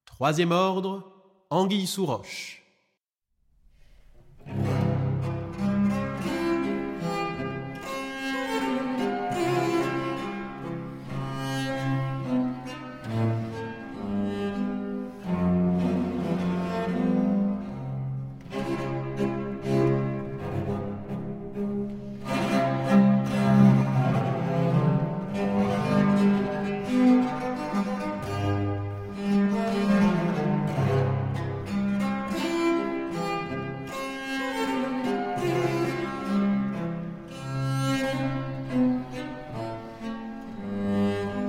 For 2 Viols; F. Couperin Pièces de clavecin, Livre IV, Vingt-deuxième ordre

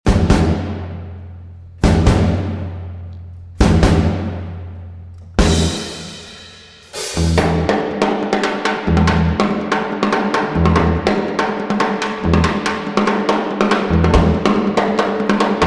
Instrumental; Live From the Queen Elizabeth Hall

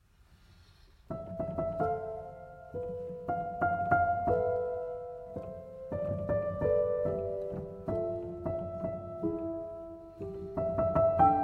Icelandic Folk Song, Upright Piano

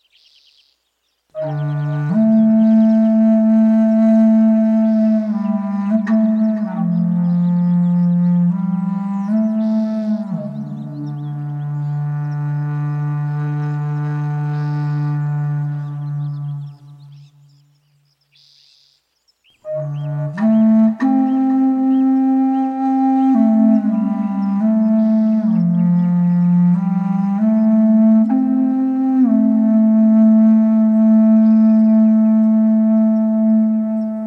Bass Flute Lullaby

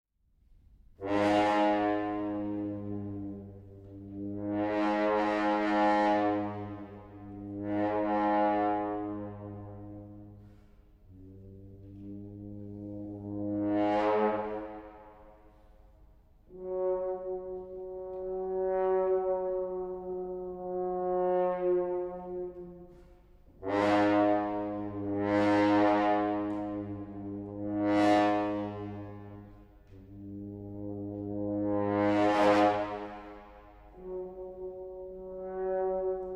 Solo Horn

